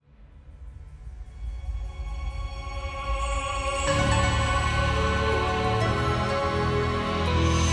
Battle

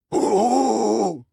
Sentry Fat Charge; Ruins

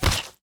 Flesh

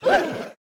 Damage

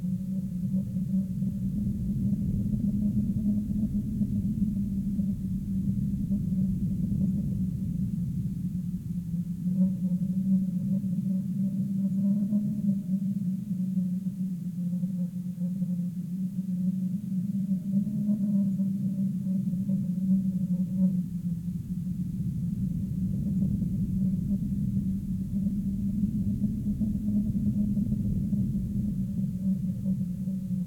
Hole wind

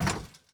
Platform Land

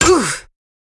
Paladin M Damaged

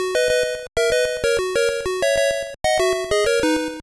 Fanfare; Frogger

